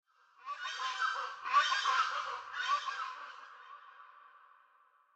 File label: ambience good bird; smite; conquest